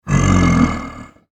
Ogre Noise; Monster Sound Effects; fantasy sound effect

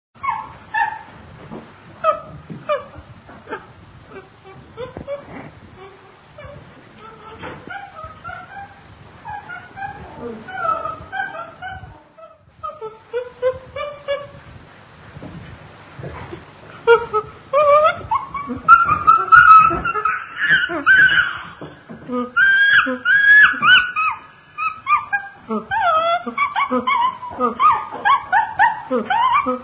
Chimp, monkey; Monkeys; Animals; recorded in a custom built sound booth; Bobby Cole

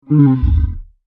Dinosaur Groan, Creature

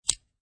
USB Retract; Sliding retractable USB thumbstick back in; Computers; Michael Huang

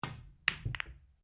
BILLIARDS CUE BALL BALL, Home billiards table; Pool; Sports

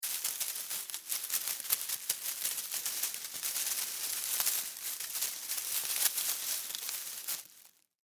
Insect Legs Walk Leaves Foliage; Insect Movement; Animals:Insects

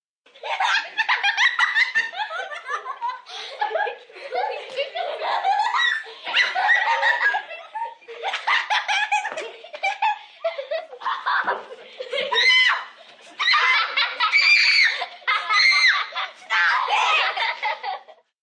Group Laugh Squeal

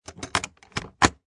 Unlocked Latch Locking; Latch Sound Effects; Lynne Music

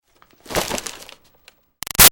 Foley Box Hit Xmas Present; Crinkle; Hits; Objects

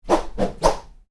Swish: Multiple Swishes, Short, Light; Whooshes; Sound Design; Whoosh Sound Effects; Whooshes (Sound Effects:Sound Design); Stuart Duffield

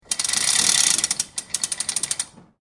Chain Through Pulley Fast Speed; Metal Sound Effects; LYN103 package of Chain Sounds; Lynne Music

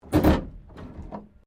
Wreck Creak, Wrecked Cars; impacts, crashes, scrapes; Objects

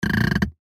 Creak Short, Creak Loud Short; Creaking Sound Effects; Foley; Blastwave

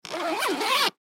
Violin Case Zip, Violin Case Zip Close; Strings; Blastwave

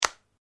PHONE MOBILE FOLD CLOSE, Japanese cell phone; Cell Phones; Electronics

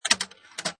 Pinball Sound Ball Slots Into Place; Video Game Sound Effects; Video Games (Sound Effects:Games)

